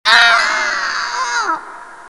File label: human death female